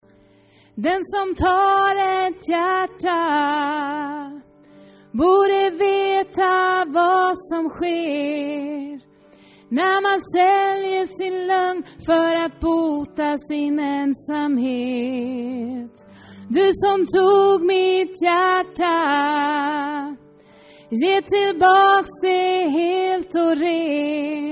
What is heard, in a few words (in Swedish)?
bas